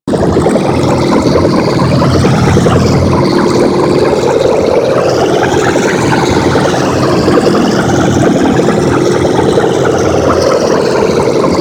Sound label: Combat Pod Forward Loop; Vehicles; Crp Combat Pod